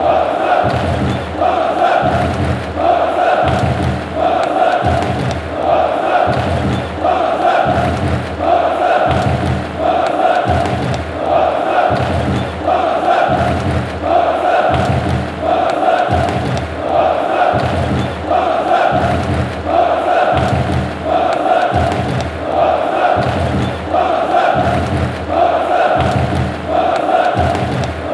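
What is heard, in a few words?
fanchants, football chants; douglas santos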